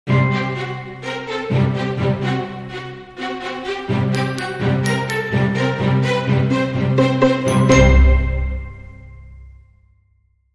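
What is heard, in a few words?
Dramedy Sting, No Percussion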